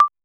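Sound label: messaging app notification, bubble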